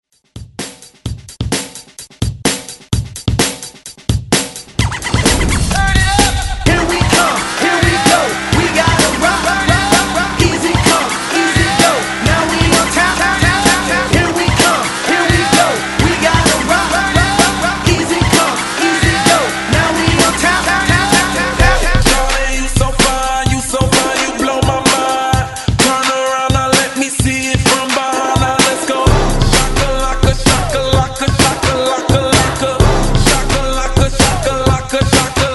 Hype Re Drum 128 Bpm; DJDOLLS

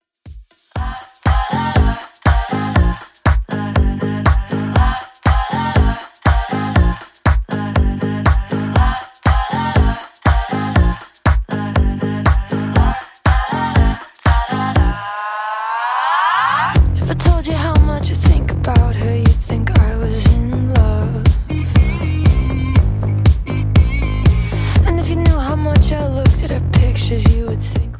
Top40 Redrum 120bpm

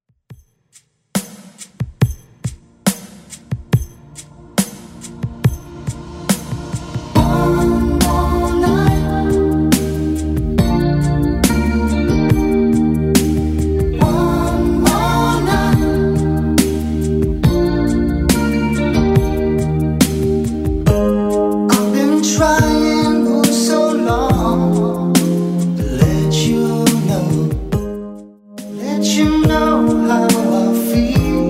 80s Chill Redrum, 70 bpm

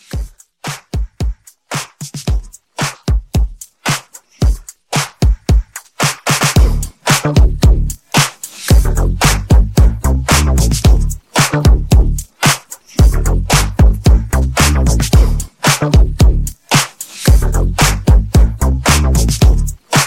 Club Edit 112

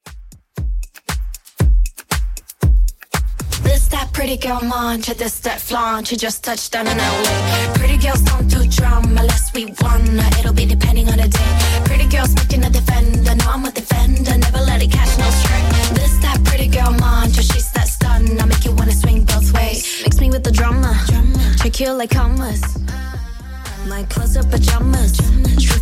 Top40 Redrum 117bpm

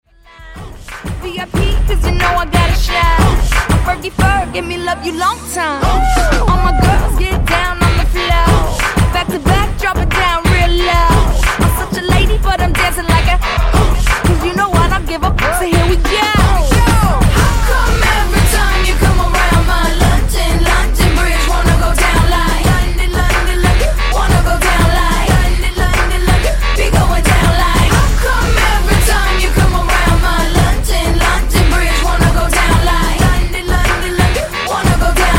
Funk Wordplay 91 Bpm

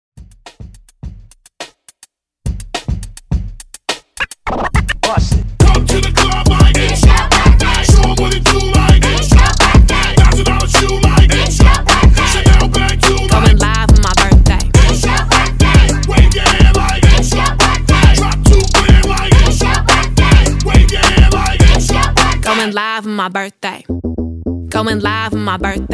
Hype; Redrum

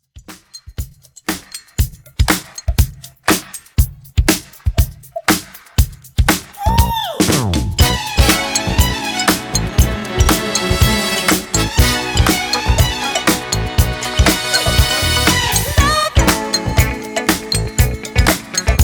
Redrum 120Bpm

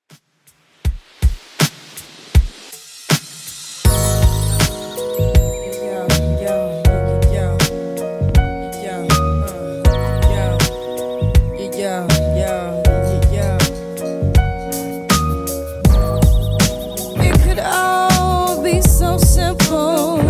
90s Redrum 80bpm

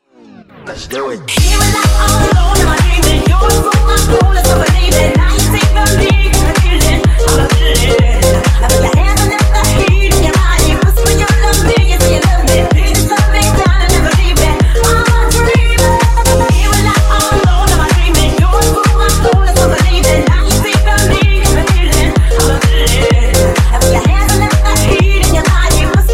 Disko Funk Remix, 127 Bpm

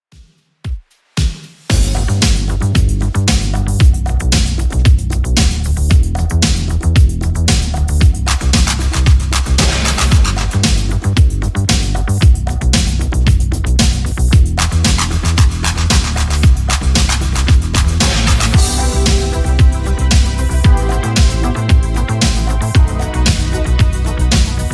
80s Redrum 114 bpm

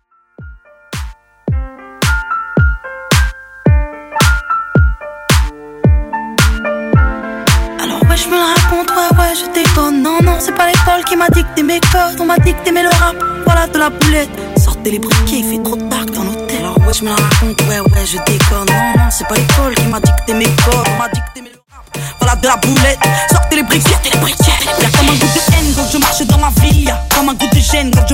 French Moombahton 110bpm

